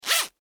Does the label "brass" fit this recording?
No